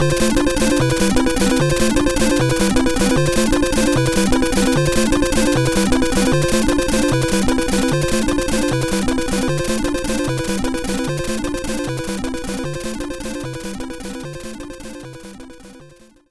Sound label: BGM Game Bonus Hurry; Super Mario Bros. 35